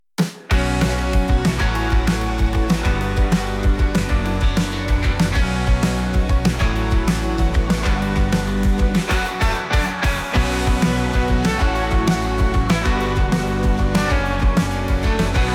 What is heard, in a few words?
Instrumental